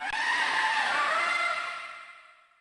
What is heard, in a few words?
Barugaron Roar; Trading Battle